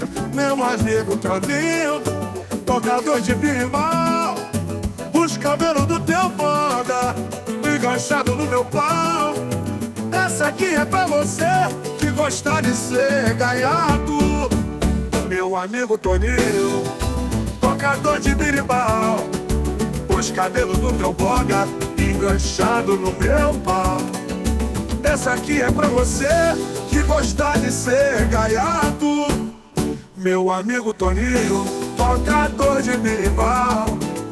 Berimbau

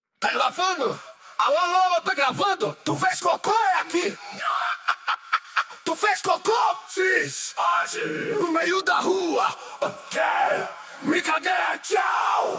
vocals

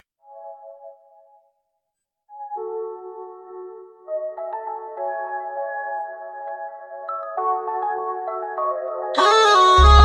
Instrumental

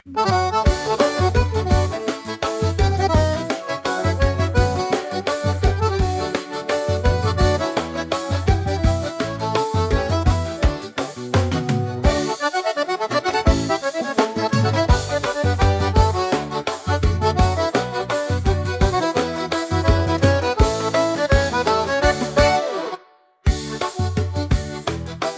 Instrumental

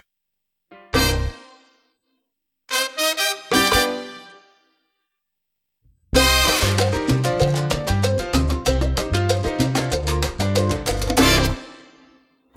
Instrumental

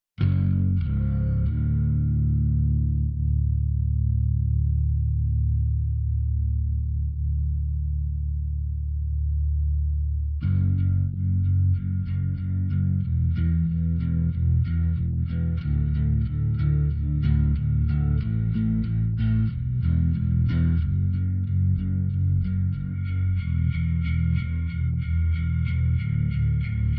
Bass

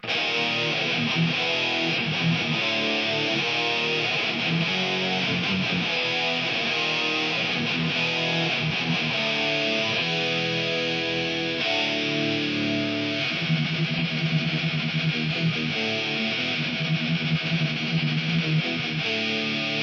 Guitar

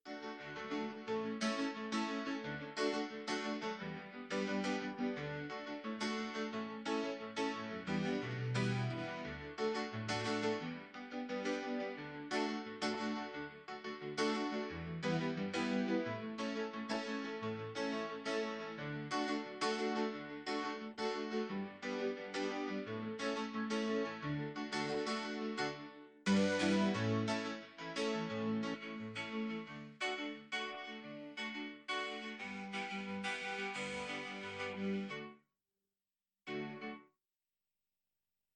Keyboard

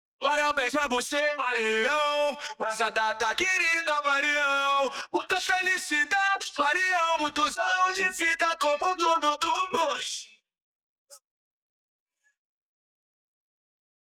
Vocals

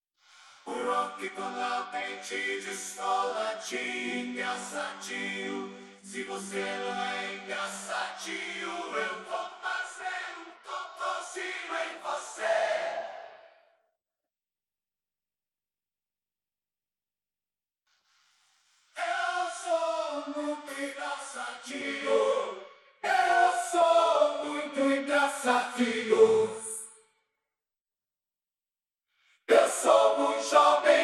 Backing Vocals

